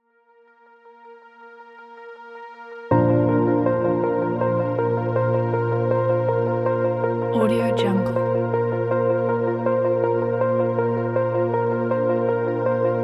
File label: documentary ambient